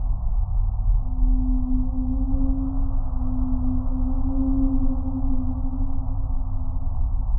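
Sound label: deep sea ambience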